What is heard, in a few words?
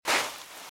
Footsteps Sand Dry Walk Right; RadwinIsland